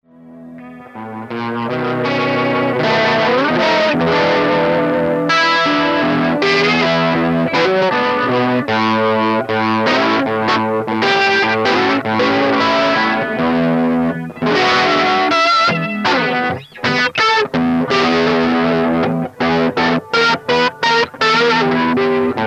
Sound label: blues